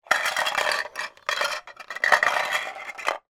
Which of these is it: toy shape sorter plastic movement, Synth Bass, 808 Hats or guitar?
toy shape sorter plastic movement